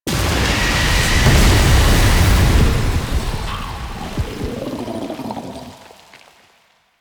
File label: Swarm Nest Stinger; Warframe; Entrati